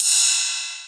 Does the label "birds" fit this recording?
No